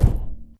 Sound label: Footstep Jog Far; Titanfall 2